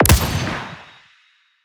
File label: imp dark gun